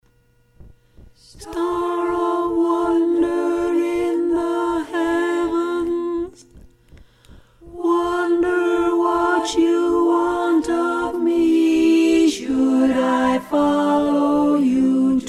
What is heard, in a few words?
Star Of Wonder Mezzo; Carols; Choir Harmonies